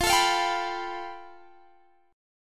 A 7th Suspended 2nd Sharp 5th

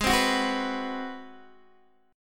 Ab 7th Sharp 9th Guitar Open D, chord

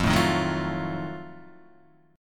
F 7th Flat 9th, Guitar Open D

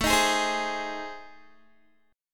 A Minor Major 7th Flat 5th, Guitar; AmM7b5; strummed

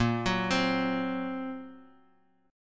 Bb Suspended 2nd Sharp 5th, Guitar, Open F; x,5,6,7,x,x; arpeggiated; chord shape; JGuitar

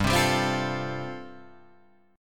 G 7th Suspended 2nd Suspended 4th G chord

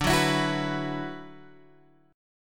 D 6th Add 9th D, Guitar, Open G

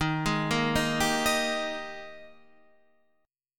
G sharp Major 7th Suspended 2nd

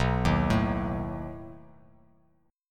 B 7th B down; B7 arpeggiated; JGuitar

